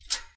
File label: Gate locked; Phasmophobia